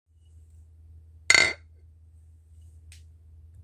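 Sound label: bottles striking each other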